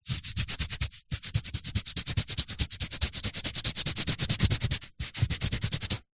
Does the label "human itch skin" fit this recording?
Yes